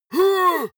monster growl attack; schreie

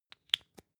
lipstick close soft; haus-garten; badezimmer

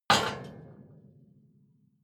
metal impact iron ring rattle

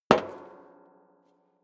step leather shoes metal rattle single left; schritte

